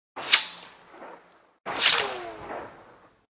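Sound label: swift turn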